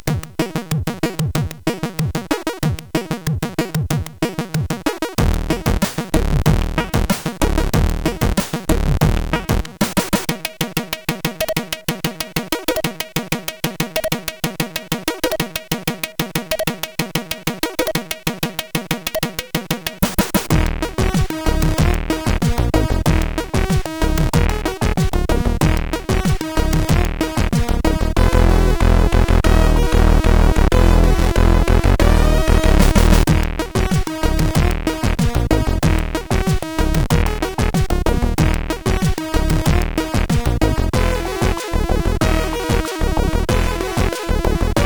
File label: Short Muzak, CSG8580R5